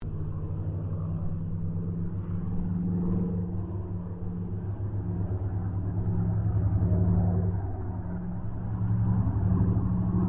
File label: Kittyhawk Boomerang pass, Airplane; Mixed aircraft squadrons; Vehicles; Aircraft Collection